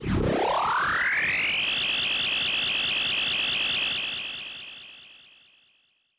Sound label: charge